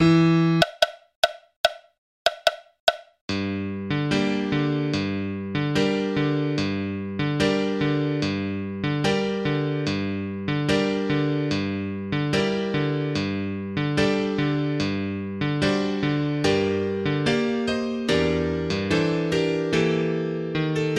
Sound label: Carnival Tango, MIDI